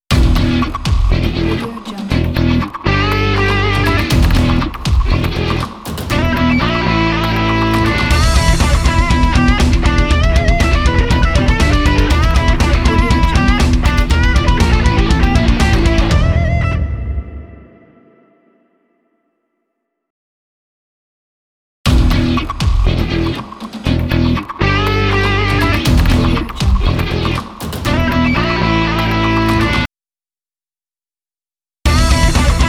radical sports logo

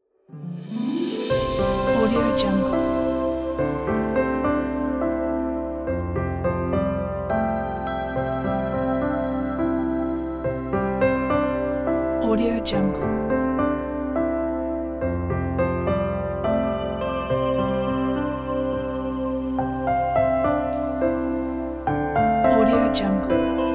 beautiful piano

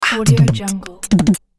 beatbox drum riff speed up

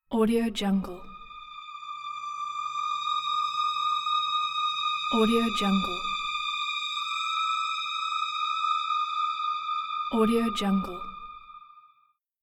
dark tone

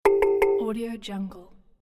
knock knock notification

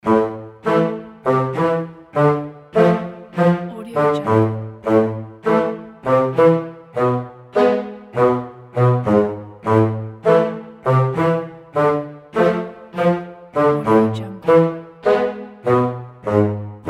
comic ident

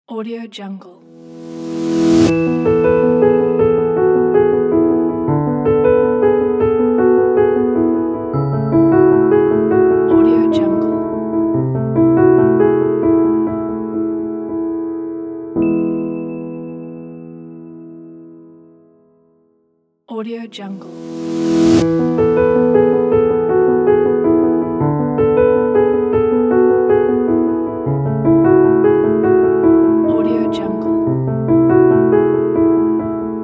piano logo; sound-of-rays

